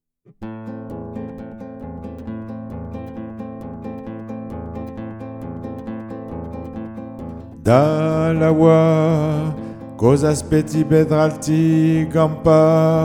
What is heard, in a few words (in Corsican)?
CORRE TIMPESTA BASSE